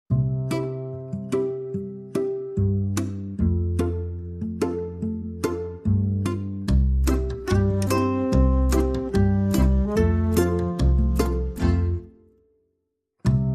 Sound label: Bavarian Folk